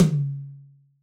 Yamaha DJX 2B, Rock Tom

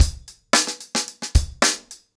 110BPM, acoustic drums; The Roots Drumloops

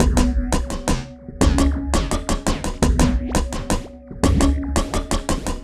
synth arp; Electrocution

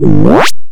WOOPS; EZRollers

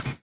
collision; Spectrasonics; Metamorphosis

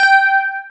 GUI, E-Guitar

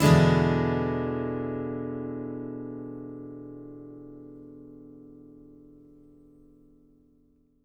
ACCGTR 7TH; Country

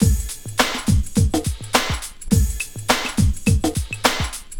LOOP2 L, Drum Loops; Big Fish Audio; Prosonus 500 Drum Loops

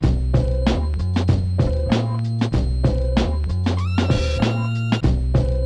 96BPM, Hip Hop; InVision Interactive; 40 Oz Phat Slammin Hip Hop

